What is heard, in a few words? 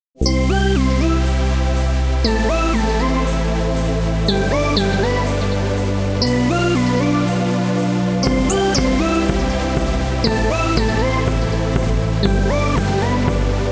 MIDI Track